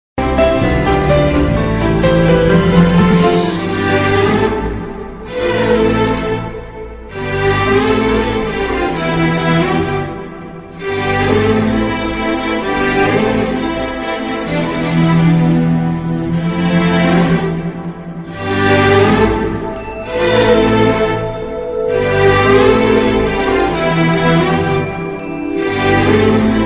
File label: love bgm ringtone